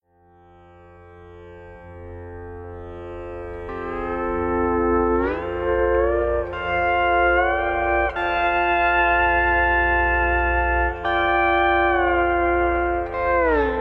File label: drone, pedal steel